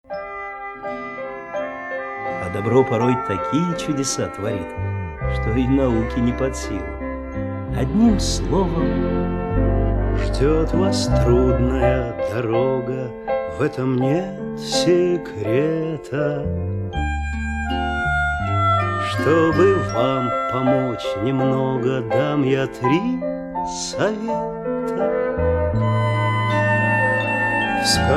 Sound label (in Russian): Напутственная песня